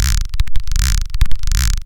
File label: Vactrol Acid; Cwejman S1; DevSnd Cwejman S1 - JK